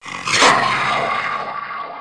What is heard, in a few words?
gonome melee; mystic radar; mr zombie1